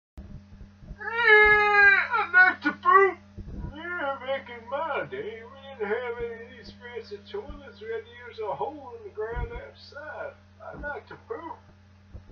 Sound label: old man poop